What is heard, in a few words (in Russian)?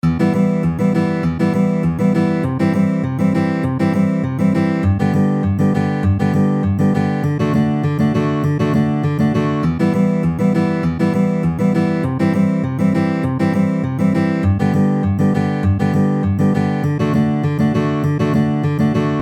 Boj Trojka s basom 80bpm